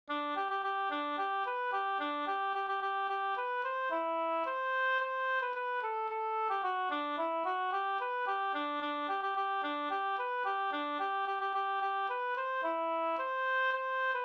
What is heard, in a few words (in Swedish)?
polka; Bjorklunds polketta Alingsas